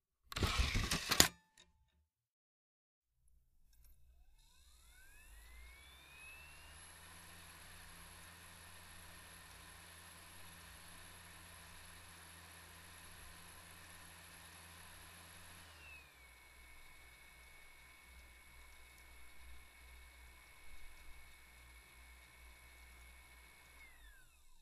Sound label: Opening, closing the CD - DVD tray, reading a disc; computer